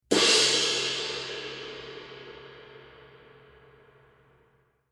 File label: 22 K Custom Special Dry Crash Crash, cymbal